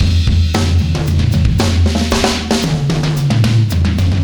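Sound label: 113 bpm, beat loops; Zero-G; Monster Beats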